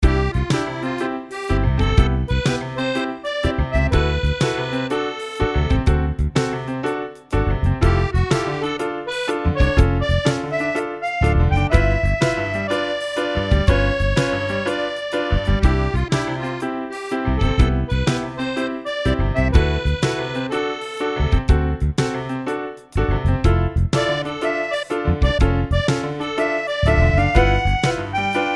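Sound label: background music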